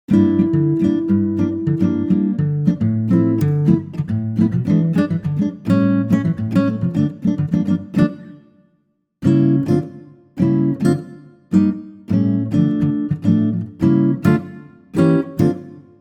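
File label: acoustic guitar D minor Eb minor E minor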